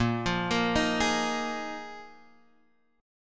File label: A sharp 7th Suspended 4th A sharp, Guitar, Drop D